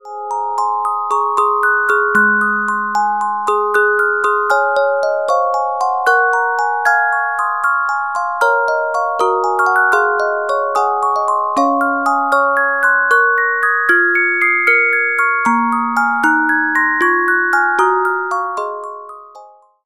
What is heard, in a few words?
Carillon Music Box Melodies